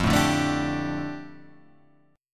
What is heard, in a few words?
G Suspended 2nd F, Guitar; Gsus2/F strummed; JGuitar